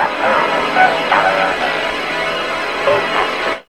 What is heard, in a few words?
VOX FX; E-Lab; X Static Goldmine 1